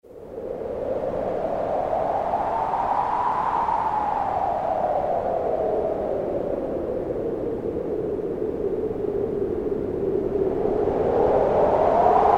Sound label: Northern Winds, Kurzweil K2000; Kurzweil K2000 V3